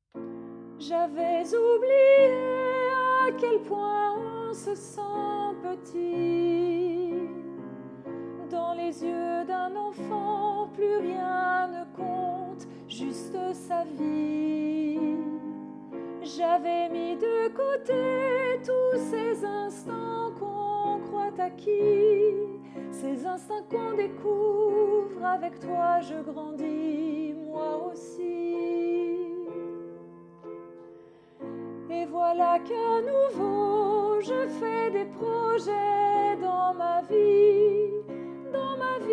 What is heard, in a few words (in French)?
soprane, chorale